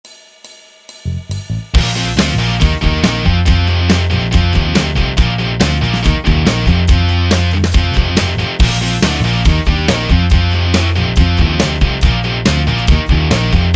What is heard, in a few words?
Backup Track Bb Major